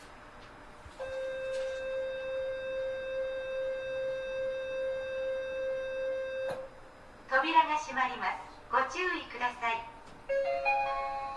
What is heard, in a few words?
nambu branchline 205, train